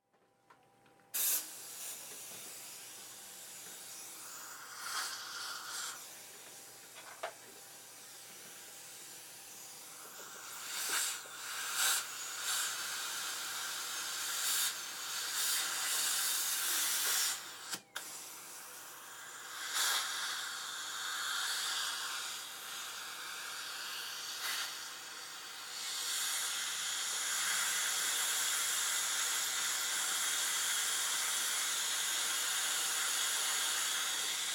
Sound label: Dentist Drill Sequence Suction; Emergency; Objects